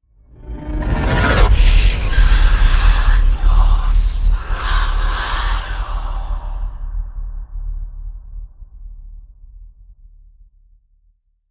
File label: Eerie Boom